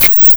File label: menu on